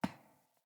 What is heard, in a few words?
Boot Walk Metal, Footsteps; Characters; Enemy; Boots; Sinecure; Melee Grunt